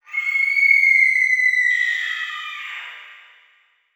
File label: Title Transition Horror Dark; Adobe; Production Element